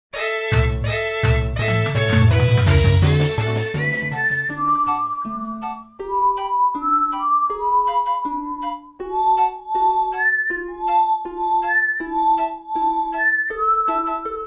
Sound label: Slider Idle